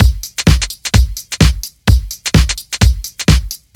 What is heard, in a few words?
House Beat, 128 BPM